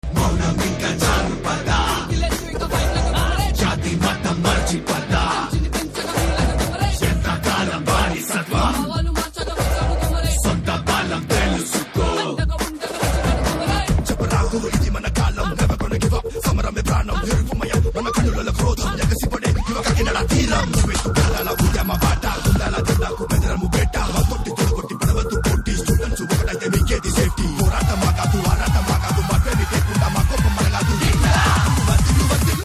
Anthem Ringtone; Dear Comrade Movie

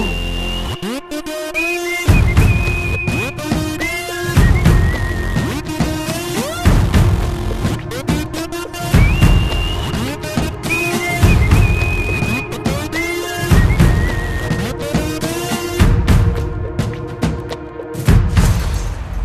BG Tone; from Kabali Movie

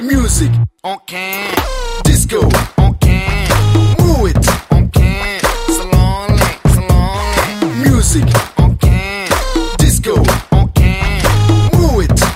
Theme Tone; Kumari 21F Movie; Telugu Ringtones

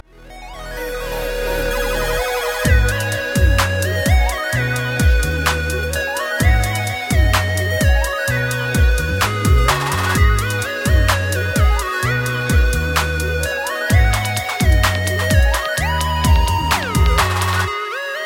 BG Tone; Mister Movie

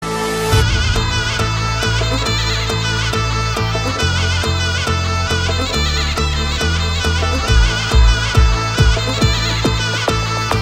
Onam Song Bgm; K-RAMP Movie

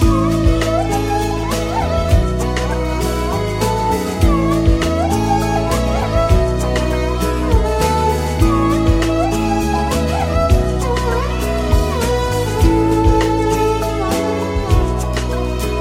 BG Tone; Yuddham Sharanam Movie

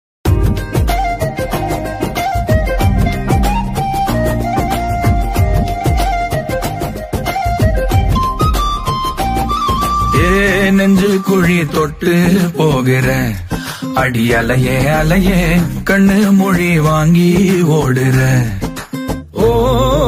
Song Promo Ringtone, Movie BGM; Parasakthi Movie; Sivakarthikeyan; Sreeleela

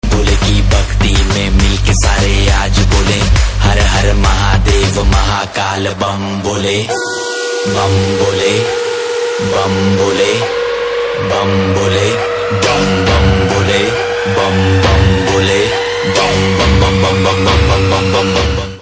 Ringtone; Laxmii Movie

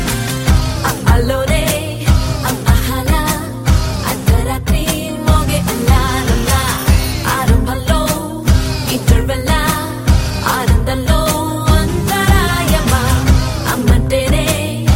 Ringtone; A Aa Ringtone from A Aa Movie

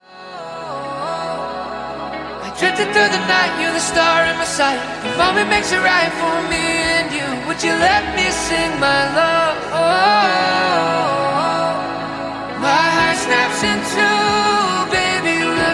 Ringtone; Sarvam Maya Movie; Malayalam

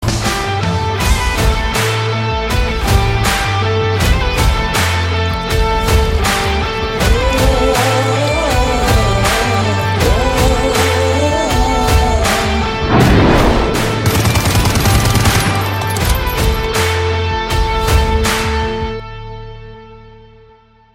Announcement BGM Ringtone

